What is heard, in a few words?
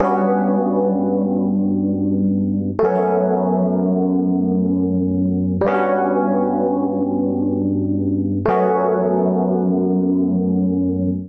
Piano B Minor 85 bpm; MIDI Clips; Tonal; Accompaniment; Ableton; Factory Packs; Chop and Swing